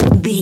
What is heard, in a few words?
footstep wood land